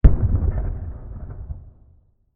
explosion low dist; modern warfare 2; amb elements

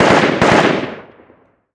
gun ak47 med; modern warfare 2; amb elements

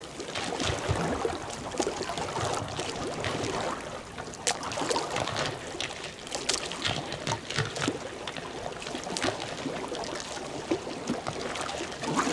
water ice; cod modern warfare 2; amb emitters

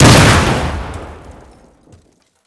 explosion door metal breach; cod modern warfare 2

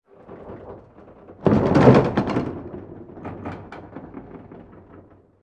ac130 rattles; amb elements; modern warfare 2

